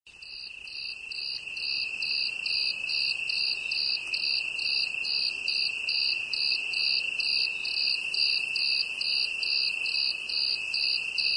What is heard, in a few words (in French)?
grillon, Le grillon